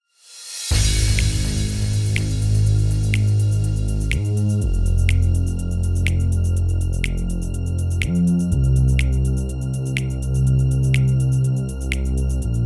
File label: Instrumental